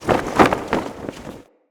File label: Flag Flap; Ambience; Grineer